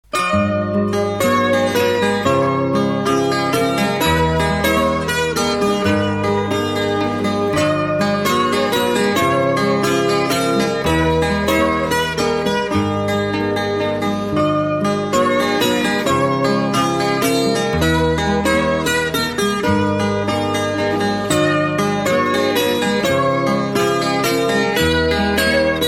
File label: portugal